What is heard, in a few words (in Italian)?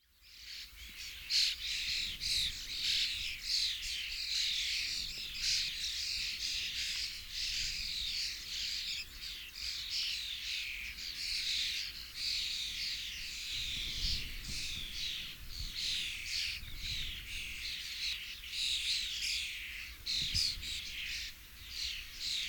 Storno Sturnus vulgaris; FEDERICO Lionel